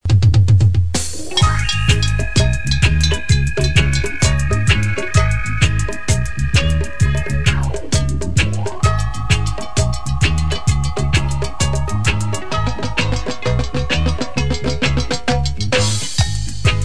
reggae beat

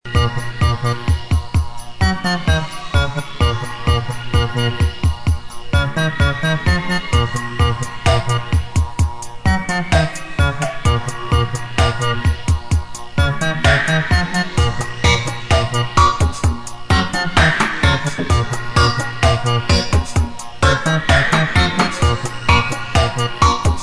bull horn

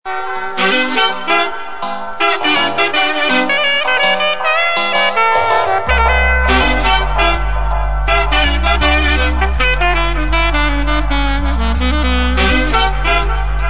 horns digistep

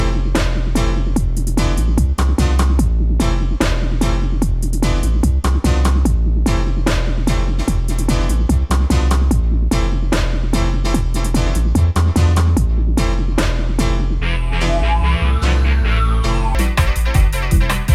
dub

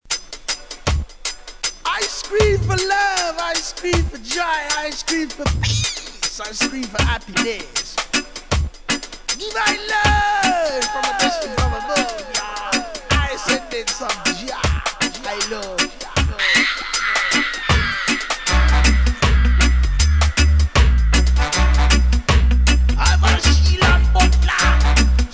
Dub

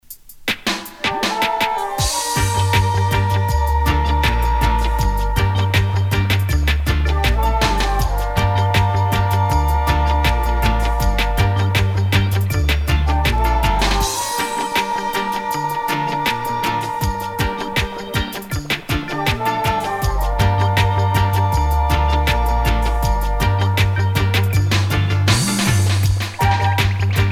horns

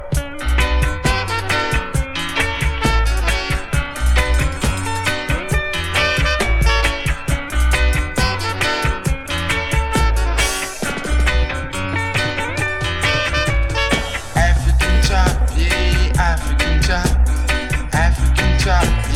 african trap

